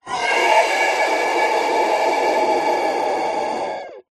horror monster demon screech

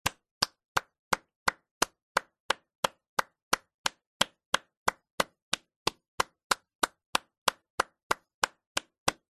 human hand clapping steady medium paced

